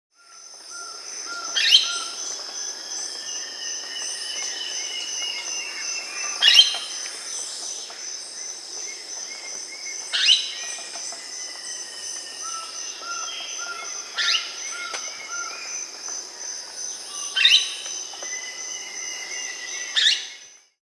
Orange-cheeked Parrot (Pionopsitta barrabandi), Peru; S. America